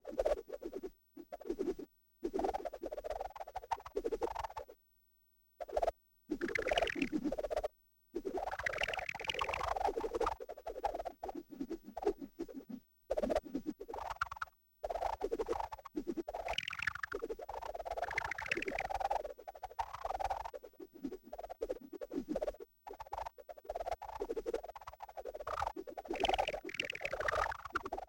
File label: Whipper Bug, Vocals - Humanoid; Sony Pictures Sound Effects Series Volumes 1-10; Sony Creative Software